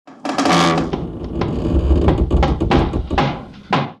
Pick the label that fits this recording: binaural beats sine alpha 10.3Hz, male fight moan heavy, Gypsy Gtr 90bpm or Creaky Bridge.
Creaky Bridge